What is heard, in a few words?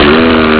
razz